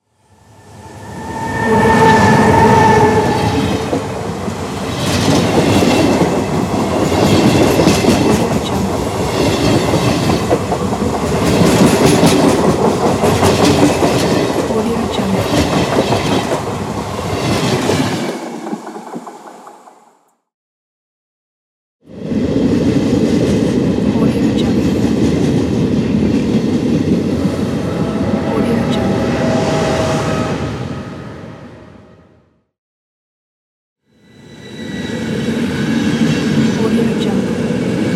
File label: train